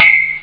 metal kling; Amiga Soundtracker sample

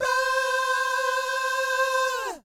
VIB FX, Vocal Planet Jazz & FX; ILIO; JAZZCOMBO

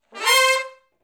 Hi Rip Up, horncraft for R&B, sections

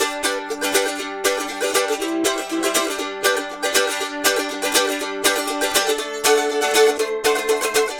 Mandolin Rhythm; Appalachian; Continental Drift Aftershocks

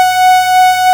PAD Geige; Best Service Gigapack 1

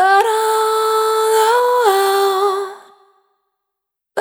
Vapor, Single Voice Melodic Loops; Pocket Diva World Class Vocal Samples

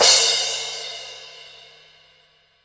sfx perc

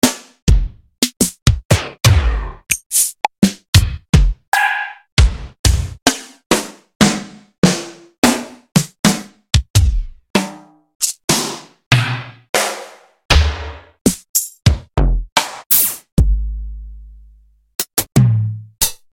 Yamaha RY-8

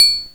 TRIANGLE MUTE, PERCUSSION

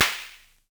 Snare, Univox SR-95

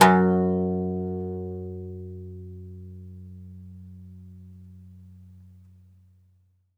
OUD F#1, Plucked Strings; E-MU Producer Series Vol. 7 – Old World Instruments

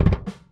Doublekick Loop; Airwindows

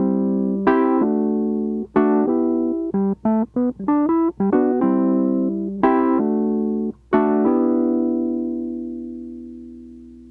Mixed Berry Jam Wurly, 70s Analog Funk; Sonic Foundry (Sony Creative Software) - What it Is! 70s Analog Funk

